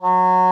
ENGLISH F#3, REEDS; Greytsounds VOL-1

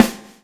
SWNG

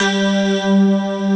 PERCVX, synth

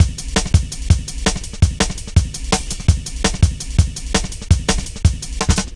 Drum Loops, Jungle

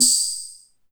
tambourine; Maestro G2

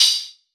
Soft Bright Crystal, Hats; Airwindows

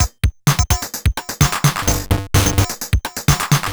Bent TR505 (128BPM), Circuit Bent

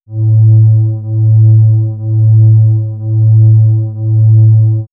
Swirls Ambient Drone, pads; X-Static Goldmine

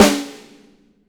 METAL, SNARE

